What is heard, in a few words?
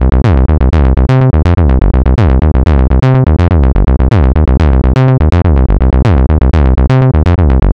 303 acid bassline 124bpm